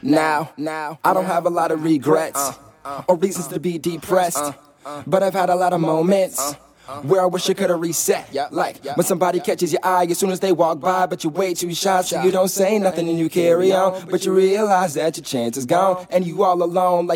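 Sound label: hip hop Rap 115 G flat minor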